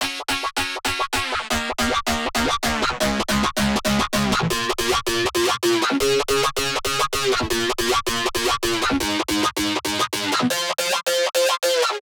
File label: outro cowbell